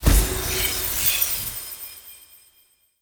Team Heal Restore; Enemy; Sinecure; Melee Grunt Dual Agent; Abilities

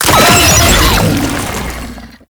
Death, Vocals; Enemies; Warframe; Proto Infested; Brachiolyst

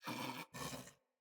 Snore; Catbrow; Pet Ship

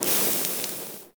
Fire Ball Charging Loop End; Warframe; Ember

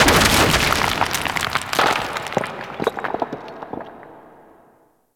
Rock Fall Medium; Ambience; Gameplay; Warframe; Orokin Moon

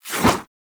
Melee Whoosh; Warframe; Sentients; Brachiolyst

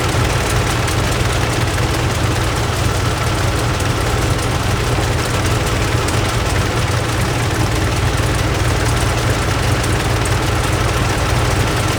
Tank Engine Idle Loop; Enemies; Movement; Lasrian Tank; Warframe

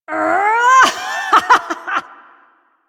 Lift Laugh, Corpus Lich; Warframe; Persona B

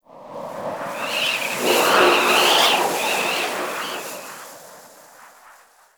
Wind Gust Med, Venus Landscape; Warframe; Venus Open World; Ambience

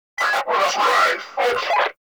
Cloak Remove Vocal; Frumentarius

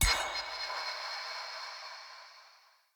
Sword Recall Button Push; Weapons; Player; Swords; Fenn Jotar Sword

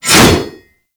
Axe Heavy Swing; Warframe; Weapons; Swings

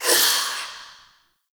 Overwhelm B; Wisp

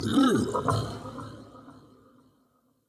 Cannonball Slam Vocal; Powers; Bromius

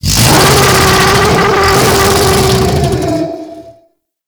Close Scream; Warframe; Tau; Sentient Vessel

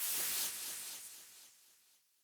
Rage Loop End; Fey; Champion

